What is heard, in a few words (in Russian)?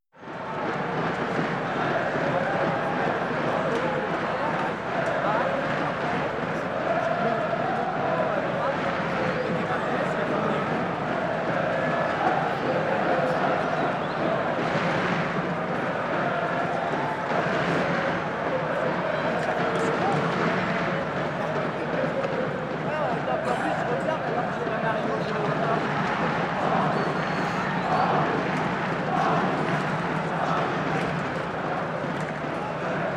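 На футболе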